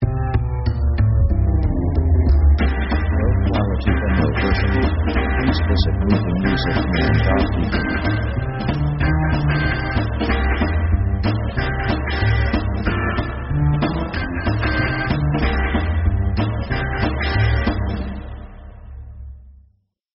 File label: Rockabilly, rock